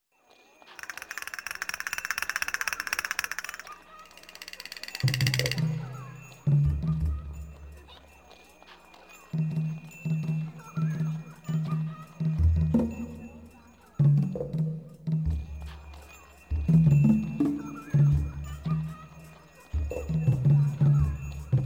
Castinet

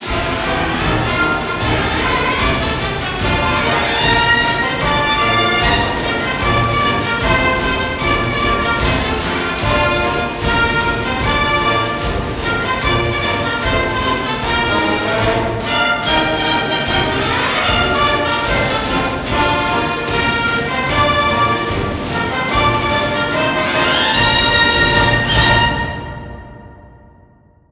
Fight Song

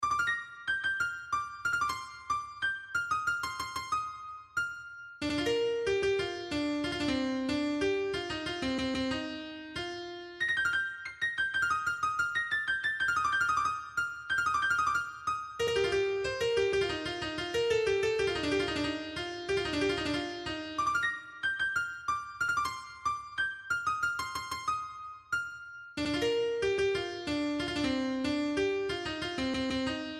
MIDI; friday night funkin vs. umbal family computer; Balls

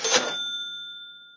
Powerup frenzy; My Talking Tom

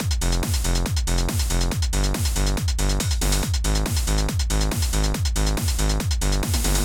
music dance; Sentry Knight Tactics

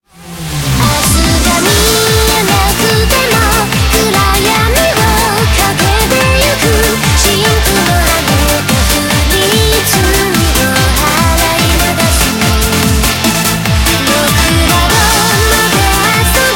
BGM xfade; Invaxion

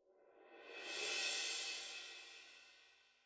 Large Dungeon Thunder Intro Cym; gamerip; The Legend of Zelda Tears of the Kingdom

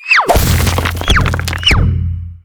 Character Attack

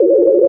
Loop Bullet Fight

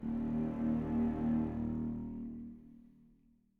Deep Hole Dive Outro

